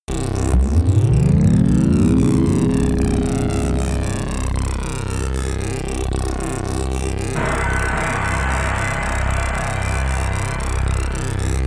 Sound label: Frigate Orpheon (Biohazard Ambience)